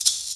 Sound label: MARACA; Greytsounds VOL-1; LATIN PERC